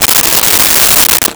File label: Unzip Duffle Bag, Foley